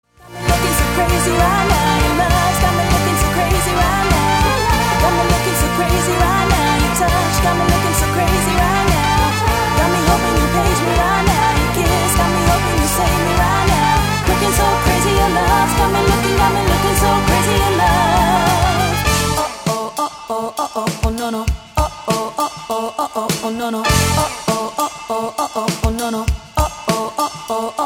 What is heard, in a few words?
Horns